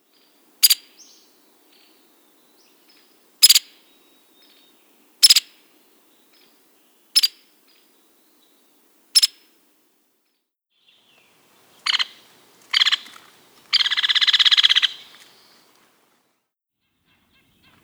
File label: White-headed Woodpecker